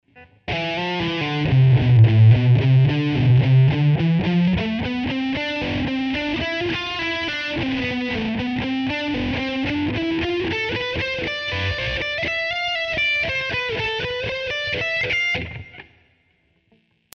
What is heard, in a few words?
scale patterns slow, guitar